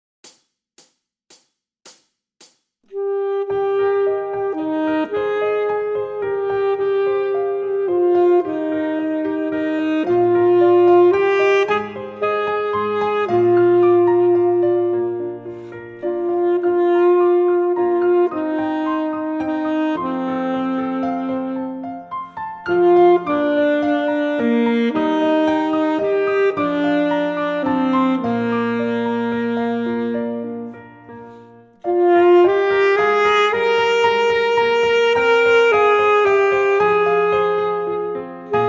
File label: Full Sax